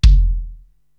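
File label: BD Tubby, bass drum, drum machine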